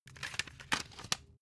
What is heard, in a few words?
cd cover open long